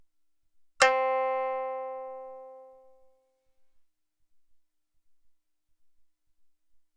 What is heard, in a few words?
shamisen high; matsuyama wave 2014